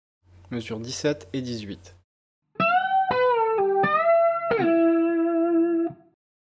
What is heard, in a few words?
bend serie